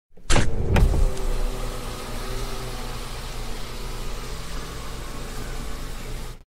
sunroof; سانروف ماشین

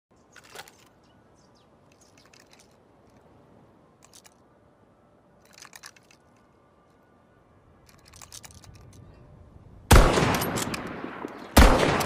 Arisaka, تفنگ; تفنگ آریساکا

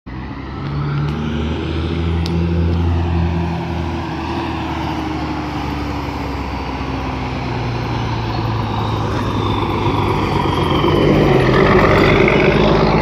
Benz bus

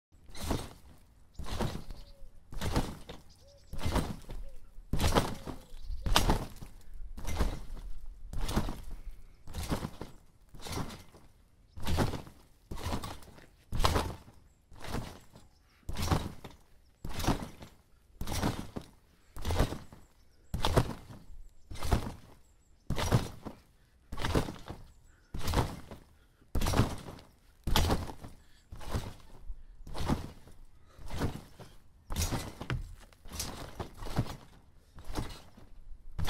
trampolining; ورزش; ورزش ترامپولین

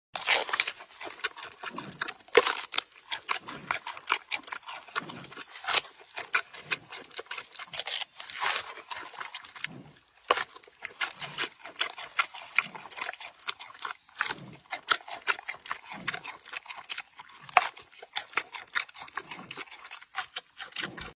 Panda eating bamboo

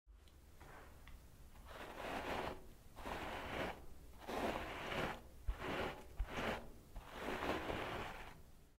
combing hair; شانه زدن مو

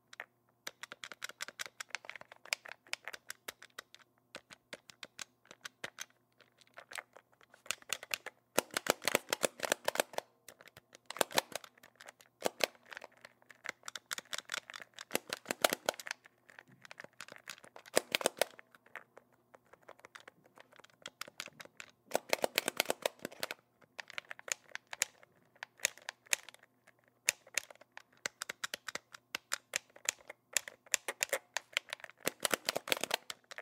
Game controller

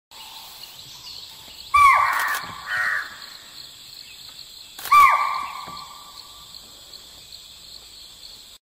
Okapi

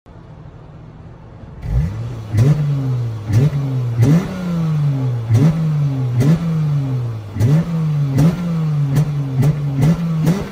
chrysler

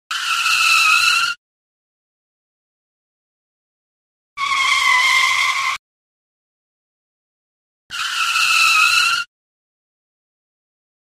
Cartoon braking

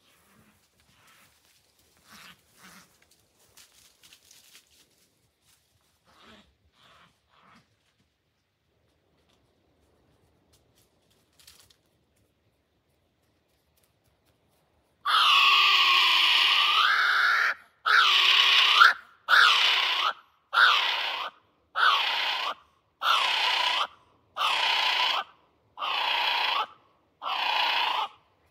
Kiwi; پرنده کیوی

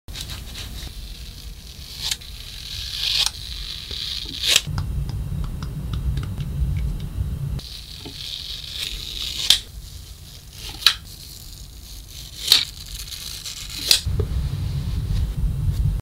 shaving face

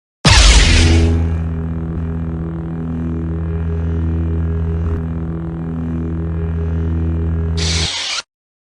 lightsaber

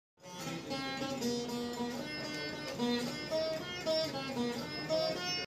Veena

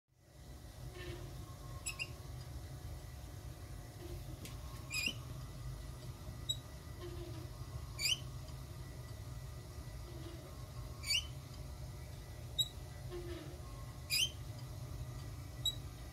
Gas meter; کنتور گاز

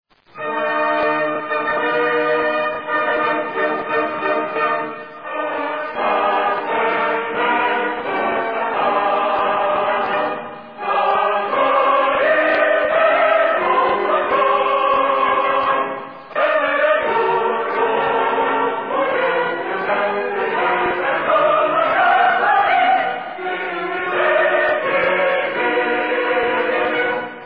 Sorod Türkiye, سرود ملی ترکیه

